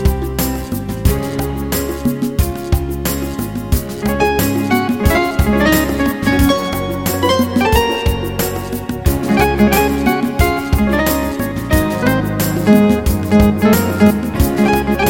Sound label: A Great Ballad